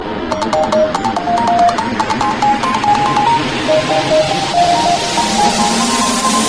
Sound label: Samsung galaxy Msg